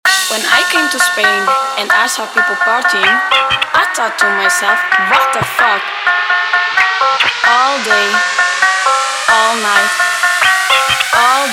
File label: mashup 130 bpm